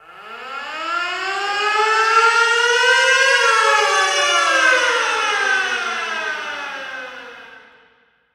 Hockey Goal Dallas; Hockey Horns & Buzzers

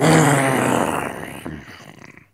Zombie; Blind Survival

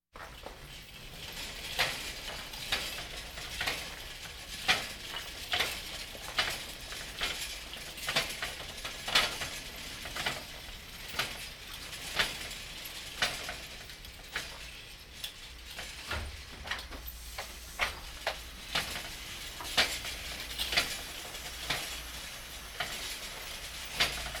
Hospital effects; Valentino Production Sound Effects Library CD50

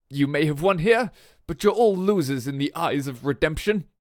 Lose; Pitch Black Audio Pong

